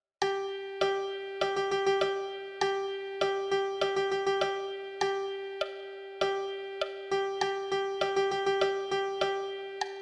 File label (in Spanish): percusion